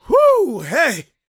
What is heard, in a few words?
shouts, gospel preacher; Spectrasonics; Vocal Planet Vol.1 Gospel; akai